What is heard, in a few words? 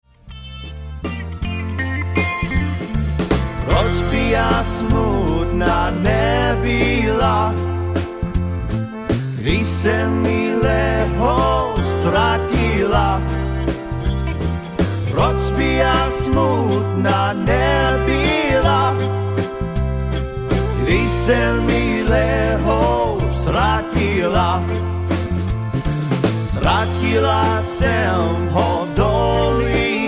Waltz